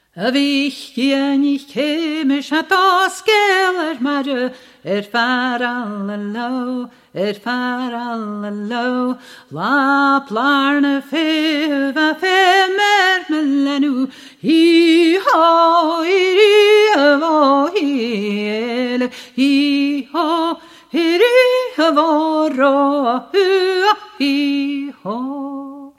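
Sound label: scot voice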